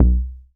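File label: kick, Korg MS-20 Drums; Polyend; Simulacrum Sounds; 1 Shots; Synths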